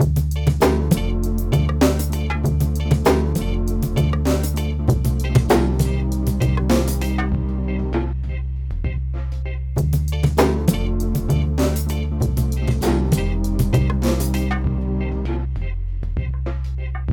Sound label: Vintage Vibes